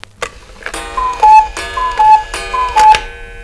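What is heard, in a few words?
cuckoo clock; orologi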